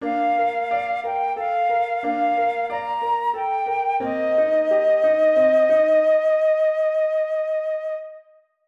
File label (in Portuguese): Sonata para Flauta bordadura; Bach